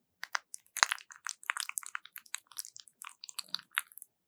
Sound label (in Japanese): 抜く; ASMR